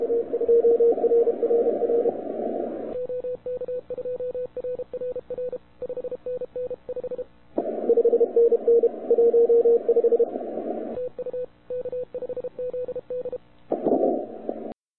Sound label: 80m, CW; OK2RRR; K5ZD; CQWWCW2008; Z dozvuků CQ WW 2008 CW - OK2RRR (MO QRP)